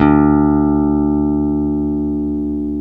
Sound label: PICK BASS, D2